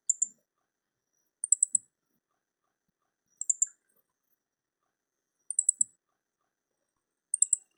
hoojiro, bird

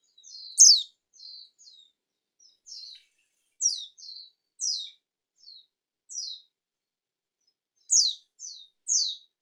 mejiro

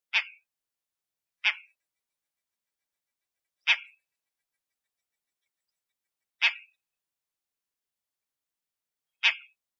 buppousou

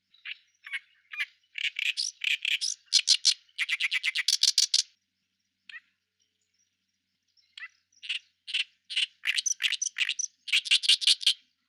ooyoshikiri, bird

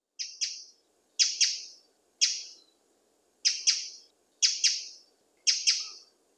misosazai, birds

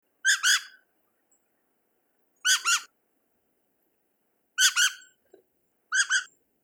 tsugumi

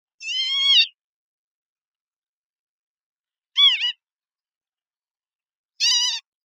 tageri

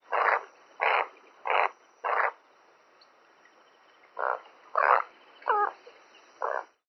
amasagi

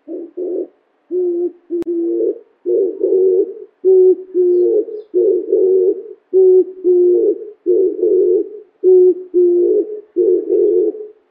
kijibato, bird

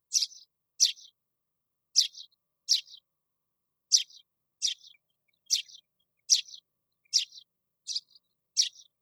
suzume, birds

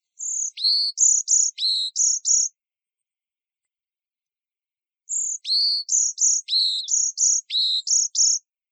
yamagara

